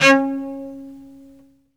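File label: SOLO cello, Strings; ILIO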